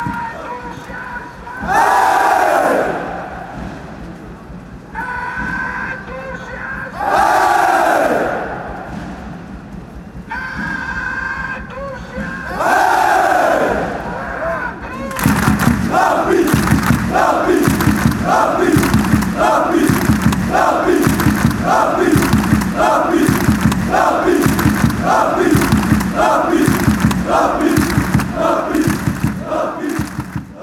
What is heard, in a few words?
rapid klatscht, chant